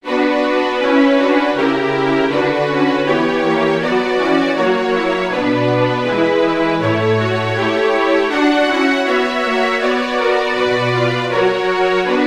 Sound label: chorale for string quartet; bach johann sebastian; sta rk mich mit deinem freudengeist